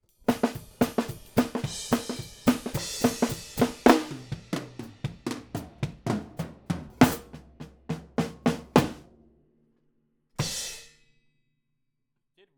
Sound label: Hat